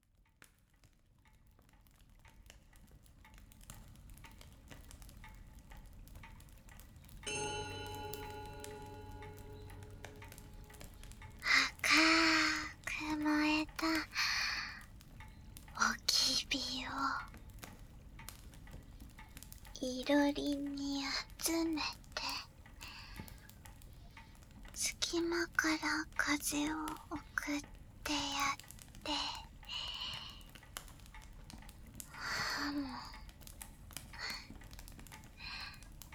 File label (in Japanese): 耳かき, お耳ふー; 囲炉裏と耳かき:お膝を枕にしてぇ……お耳ふー、じゃ♪; 高貴なツノガミ様に全身を愛されて魂まで溶けあう桃源郷温泉